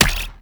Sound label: Drone Death; Warframe; Weapons; Archwing; Archwing Healer